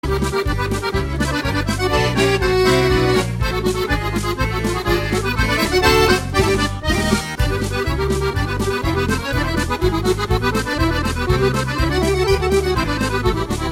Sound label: Polka